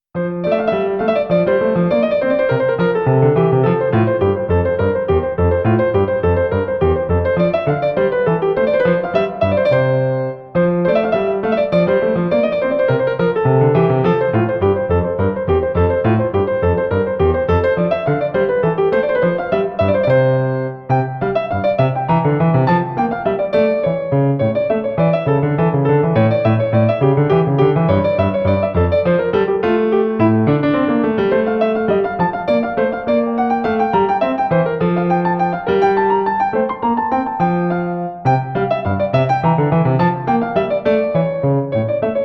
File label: Menuet BWV 113 Piano fast